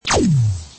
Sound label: laser sound